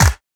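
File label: snare drum bass drum, Dance Mega Drums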